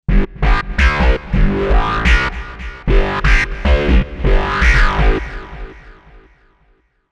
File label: Trendy Wah